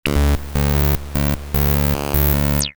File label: Random Sound; Europa